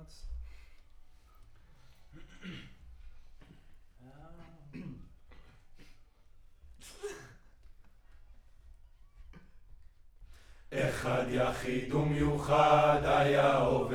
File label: Choir; Decca LR